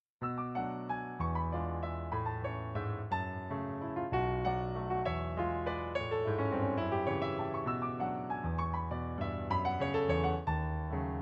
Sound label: Ringtone Waltz, classic familiar melody